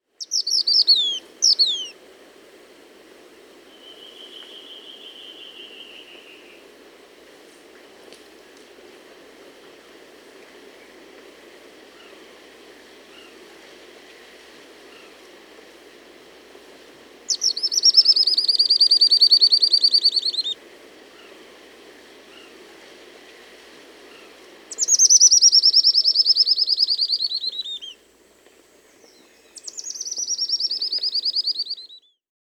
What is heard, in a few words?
Gray Elaenia (Myiopagis caniceps), Peru; S. America